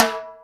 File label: Timbal, Boss DR660